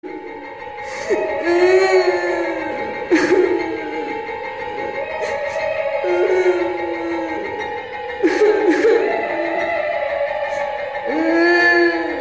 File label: witch cry